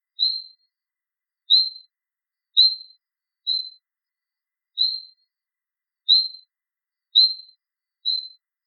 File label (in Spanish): Rana de cristal